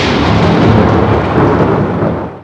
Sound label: Explode; ASUS; P4B533; Intel 845E ATX